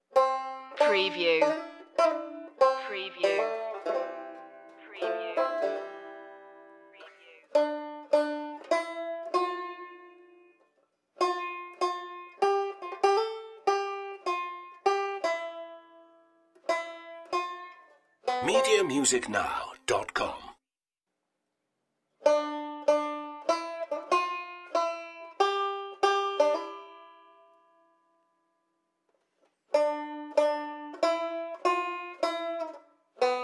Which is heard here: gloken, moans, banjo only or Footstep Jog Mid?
banjo only